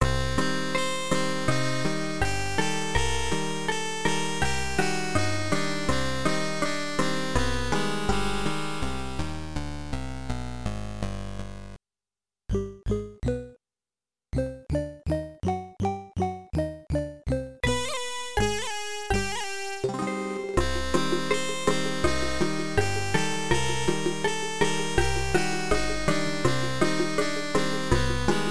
game over

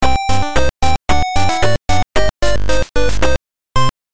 game over

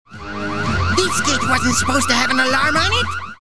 alarm; megaman

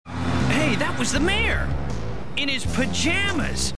shock horror; megaman

